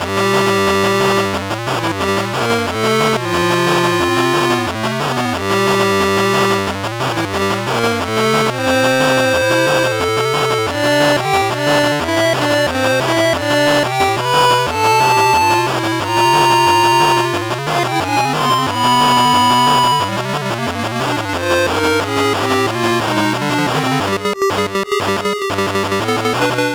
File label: battle